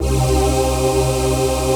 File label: VOICE PAD; Best Service; XX Large; Pads